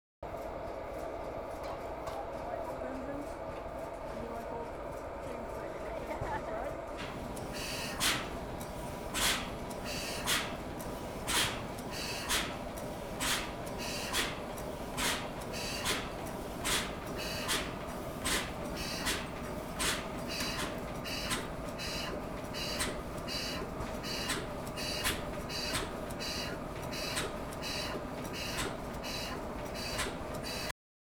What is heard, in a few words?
ole engiens